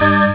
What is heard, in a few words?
pan